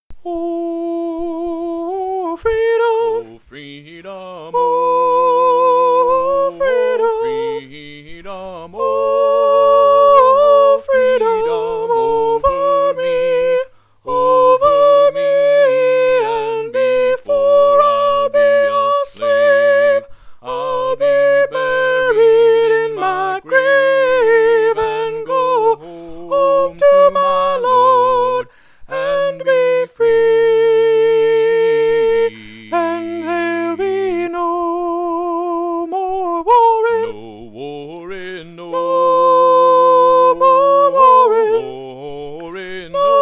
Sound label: Oh Freedom melody